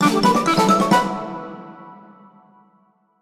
winner

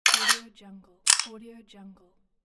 photo shoot

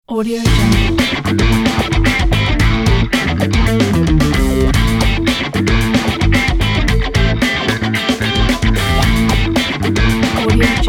funk guitar logo

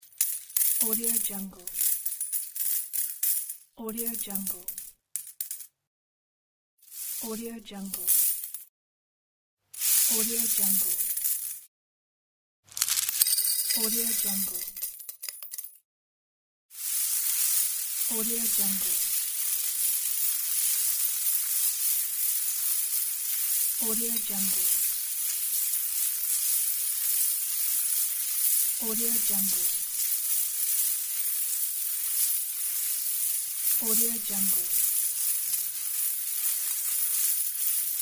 jingle coins

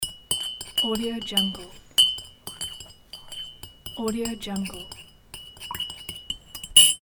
spoon stir cup

